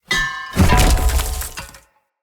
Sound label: Ground Slam; Enemy; Corrupted Sproutfolk; Combat